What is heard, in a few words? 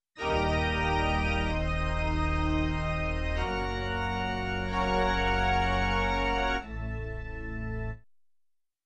Extending Organ Chords